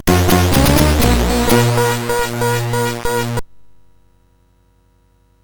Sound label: Game Music, sid CSG8580R5